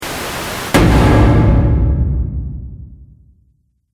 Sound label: Trailer Hit White Noise; Trailer Sound Effects